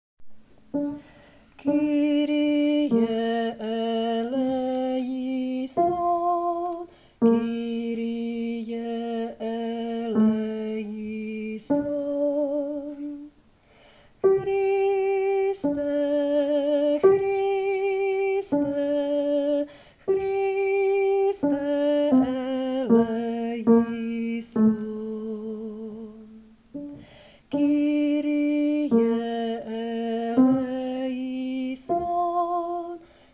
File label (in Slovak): bas; Kirie; spevokol